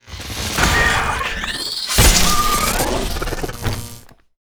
terracotta stab

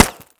asphalt small

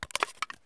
tin can drop; Escape from Tarkov

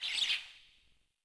Forest Bird; Ambient; Civ4 warlords

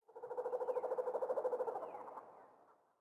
sting wild bird; Rust

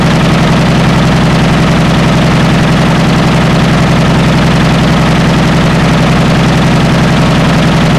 pt76 engine int rpm load, engines tanks; War Thunder

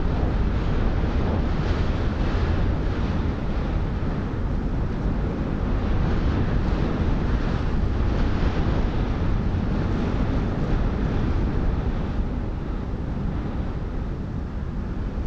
ambient battle; World Of Warships

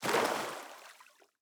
footstep water hard; Rust

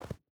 footstep rubber boots snow jump start; Rust

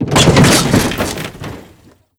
pickup land; Modern Warfare

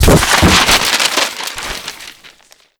explosion paper hit; Explosions; Call of Duty Modern Warfare

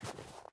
footstep rubber boots snow walk; Rust

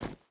footstep boots gravel run; Rust

